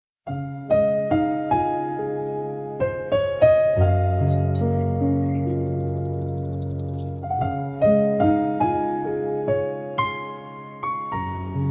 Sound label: Forest Memory Wind, fantasy music nature forest; ghibli style; wind healing nostalgia